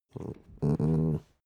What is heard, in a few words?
dog small growl very soft short